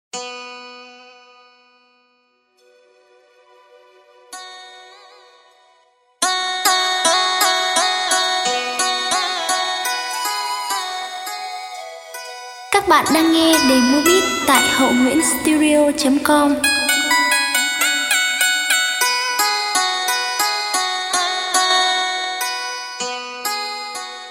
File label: Loi ru tieng nho beat